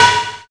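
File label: PER PROCU, Procussion, percussion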